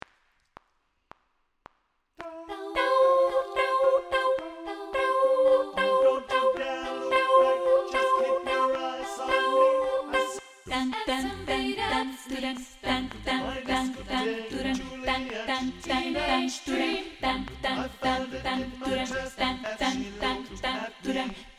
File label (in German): SOPRAN